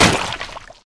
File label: hit slime splat; Ninja Blocker